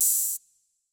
Open hihat; cinematic trailer fx soundkit

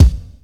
Kick; drum kit megapack soundkit

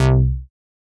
Bass; Space Jamz

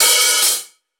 Open Hat; rnb vibez

